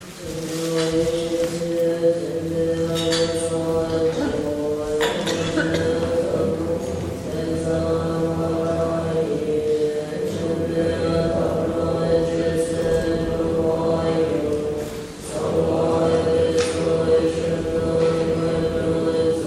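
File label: Tara lineage prayer; Только для имеющих посвящение, учение по практике Зеленой Тары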